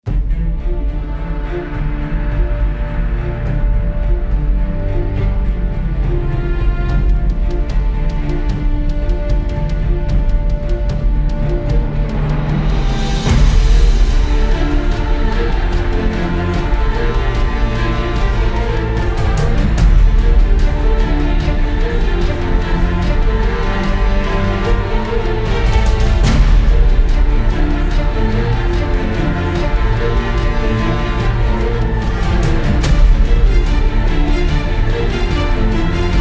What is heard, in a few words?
Cinematic Trailer